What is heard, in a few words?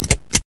click